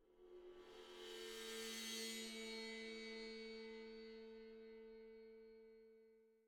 music interactive; World of Tanks

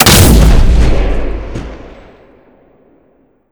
ac130 105 canon fire

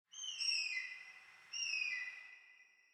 ambient tropics birds

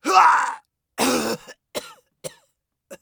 agony; Escape from Tarkov; scav4

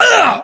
pain, english

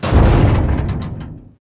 impact sheet metal medium

